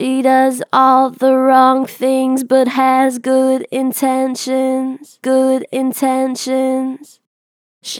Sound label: Str Vib She does all the wrong things, Vocals, Natural Vibrato Strong; Harmor Vocals